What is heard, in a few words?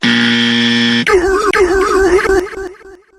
buzzer derr